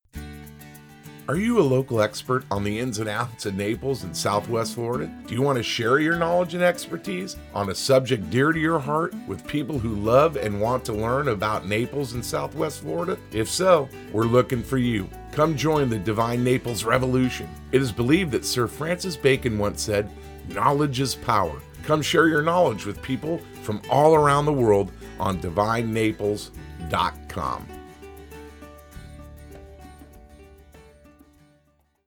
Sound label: music